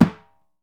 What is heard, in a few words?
deff flat; Deff Bendir &Req